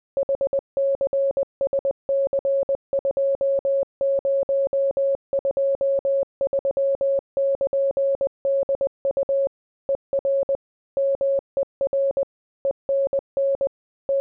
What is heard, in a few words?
morse